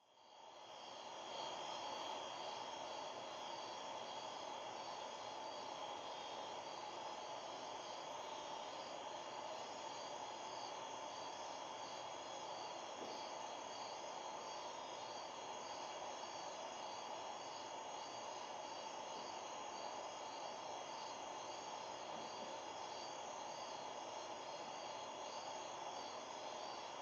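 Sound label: Crickets Night Ambience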